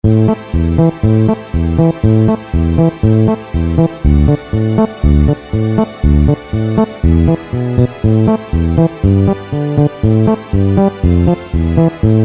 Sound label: Bass Pop Line 4/4; mfx chain